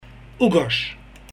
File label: bark